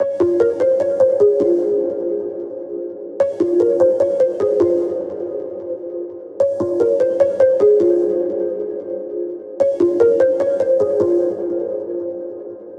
keys patch

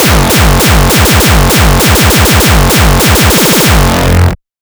kickdrum 200 bpm

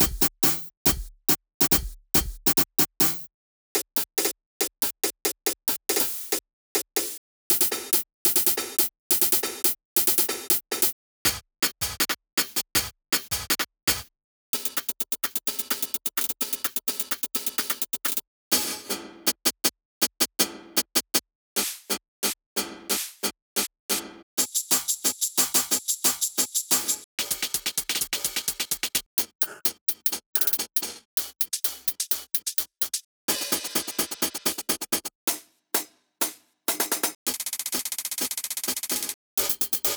hat loops

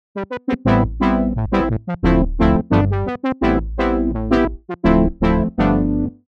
Prophet factory brass; opxpro3

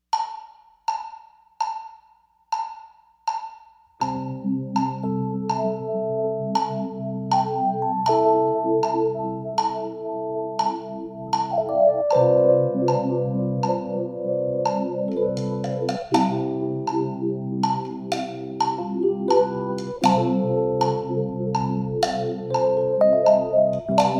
agogo

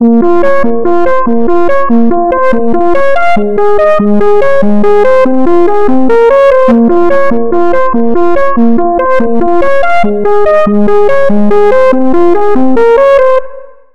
lead translucence

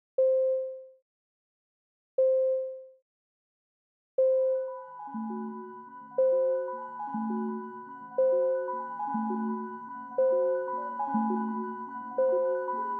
soundscapes and drones

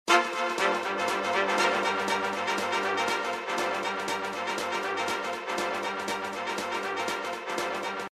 batucada

